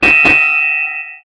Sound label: buzzer